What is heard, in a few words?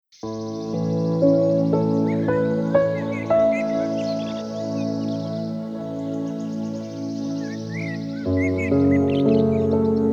Relaxing Melodies with Birds